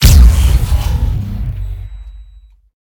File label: Sci Fi Grenade